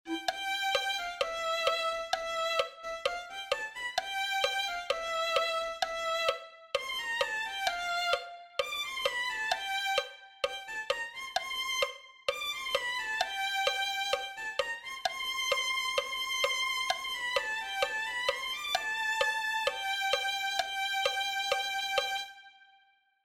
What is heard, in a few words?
violin